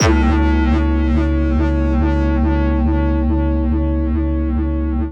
pitched, Feedback; 99Sounds; Dark Engine

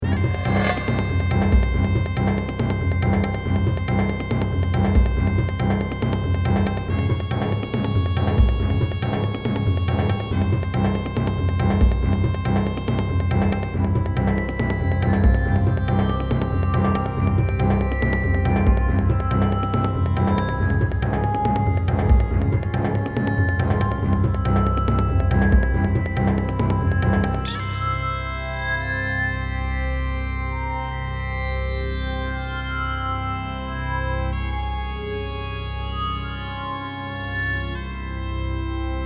Battle, RPG; The Frontier - RPG Set

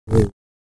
Saber; FXHome

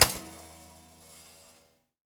toaster; Sound Design Tools; Raw Foley

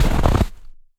snow footsteps run; Bluezone

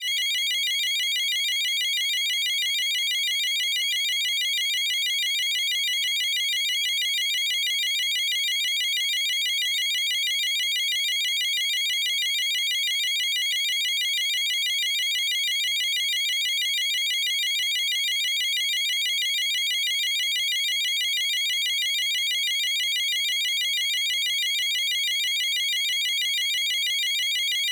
Alarms Siren High-Pitch Constant-Tone High-Rate

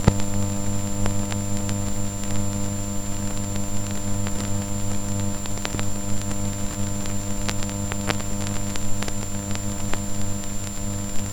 Impulse buzz tone, Newsreel Noises

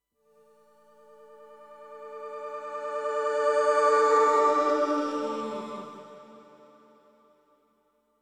Guitar Reverse, Cinematic Guitars; MatiasMacSD; AXmos

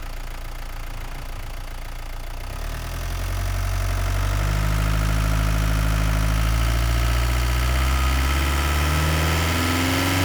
Renault Master 2.8dTi Engine Accelerations Wind; Renault Master II; RAW; Mono Mic1

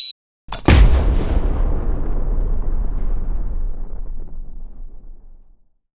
beep mine explosion; Bluezone Corporation; War Zone (Designed Explosions)

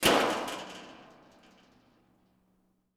Metal Sheet; 99Sounds; The Warehouse

